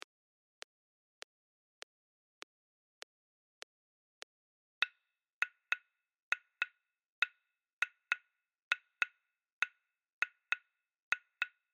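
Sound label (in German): Djelidon 100bpm, Afrikanische Rhythmen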